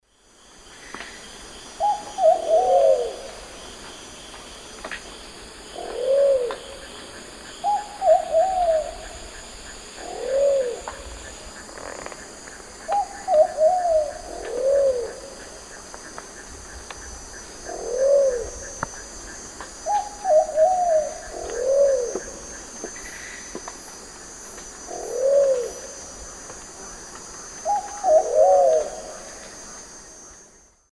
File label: Patagioenas plumbea, song and call 2 birds; S. America; Eastern Lowlands